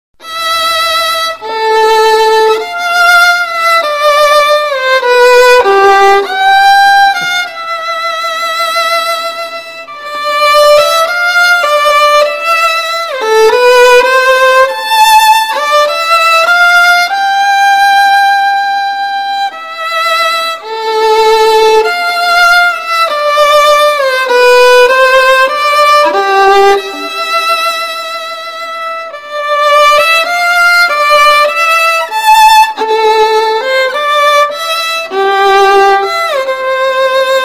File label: sad violin meme sound; spongebob